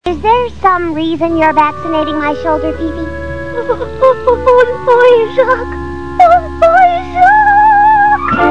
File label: scared